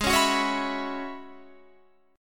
Gb 7th Suspended 2nd Suspended 4th Ab

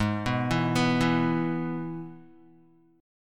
Ab Minor Sharp 5th Ab down Guitar; Abm#5 arpeggiated; JGuitar

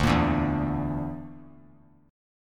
D Suspended 2nd Sharp 5th D; Dsus2#5; strummed; JGuitar

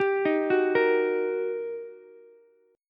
G Minor Major 7th Sharp 5th, down, Ukulele; GmM7#5 arpeggiated; JGuitar

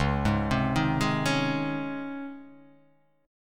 C sharp Major 7th Suspended 2nd C sharp

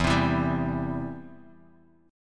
E Minor Sharp 5th E down Guitar Open D

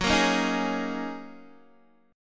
F sharp 6th Add 9th F sharp Guitar Open D

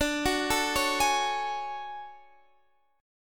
D Major Sharp 11th D Guitar Standard; arpeggiated; x,17,16,14,14,16; capo=2

